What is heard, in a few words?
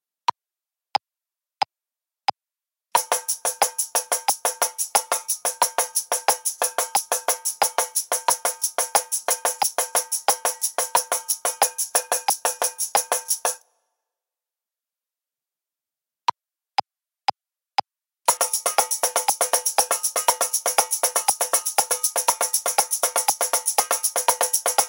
Shaker Tambourine